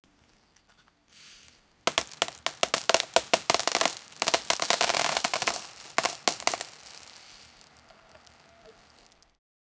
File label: marshmallows falling on a